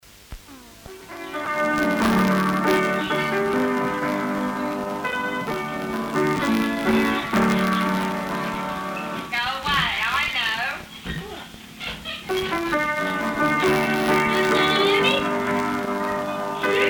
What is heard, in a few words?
playing piano